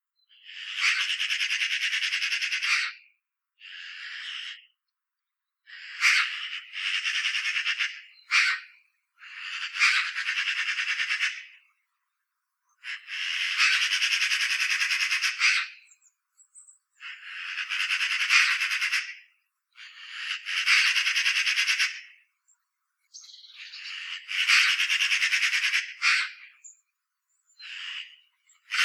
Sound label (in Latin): Pica pica; SETE